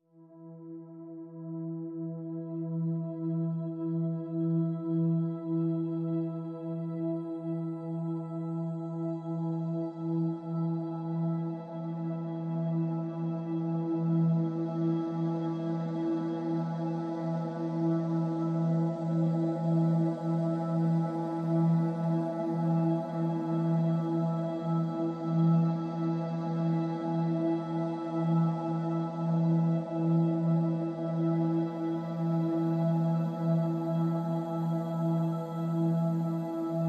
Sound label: Empty, Pads Only